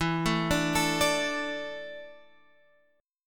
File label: E 7th Suspended 4th, Guitar, Half Step Down; E7sus4